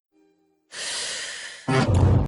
charging; kazuma

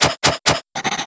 writing; dobinbough

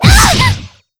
heavy hurt; arcueid

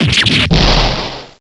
fan

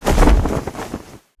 uncloak; barok

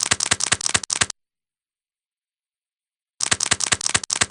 bite; oscar fairplay

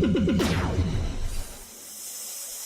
gear

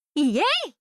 yay

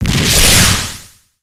thought route split

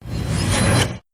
stand reverse; jjba

